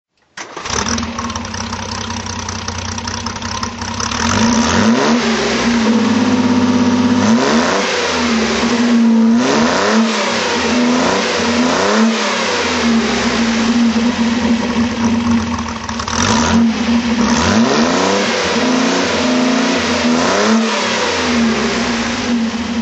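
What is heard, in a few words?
austin healey 3000 mk3 ph1 throttle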